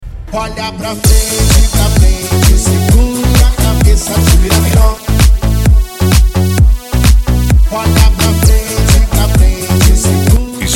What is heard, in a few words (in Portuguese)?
sound car carnaval